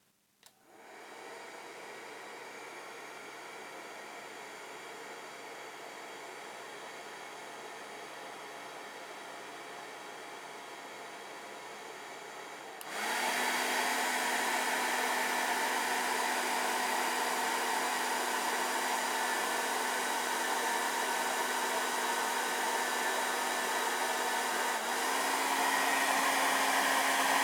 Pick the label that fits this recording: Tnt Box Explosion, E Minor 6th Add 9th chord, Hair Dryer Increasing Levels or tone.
Hair Dryer Increasing Levels